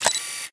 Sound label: ball